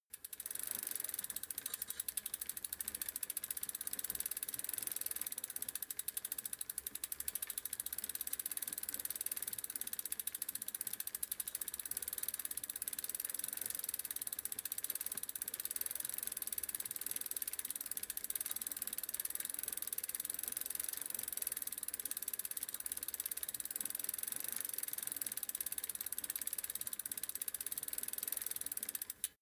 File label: bicycle street spin backwards slow; fahrrad; verkehr transport